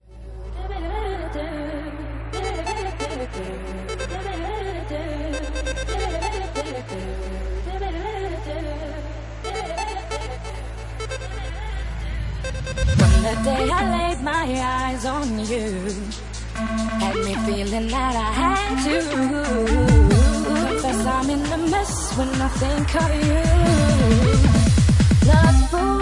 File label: Electro Bass Remix